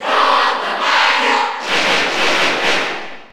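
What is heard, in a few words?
Cheer Spanish, crowd; Dr. Mario; SSB4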